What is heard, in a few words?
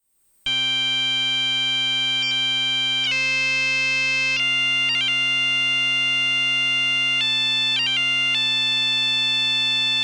techno pipes; テクノパイプの音（前半は原音、後半は環境処理した音）; フェイガーシュトロム社の電気バグパイプ